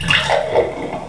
Bierglas; Skat 3.0 Demo

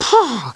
seufz; dorf

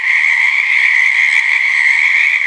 FROSCHE; Break; Austral

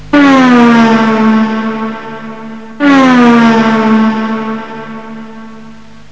AUTOBHN; Kunsthaus Tacheles; stau

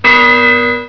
glocke

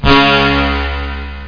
fraese

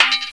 Schwert; Anno

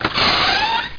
TUER; MOPSY

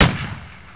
Muskete; Anno

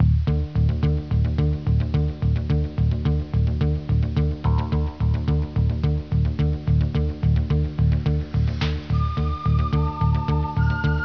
musik

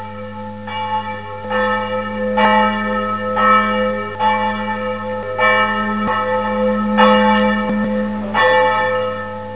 glocken

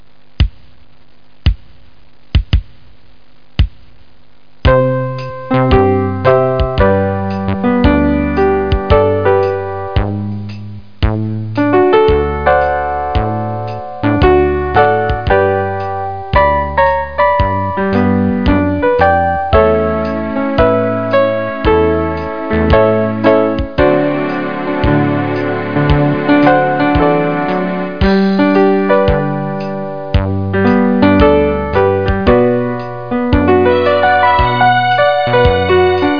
walzer

